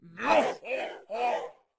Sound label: Dark Peasant Attack Vocals